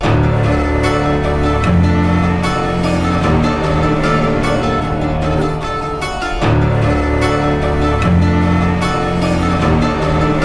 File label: Metro Fight BGM Extended Mix; The GOAT Metro Fight BGM Extended Mix; without fight sounds